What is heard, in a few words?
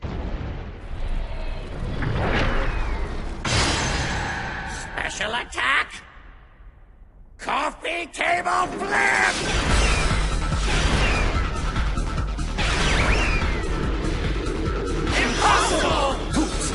coffee table flip